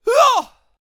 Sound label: Attack Vocals; Devout Gauntlet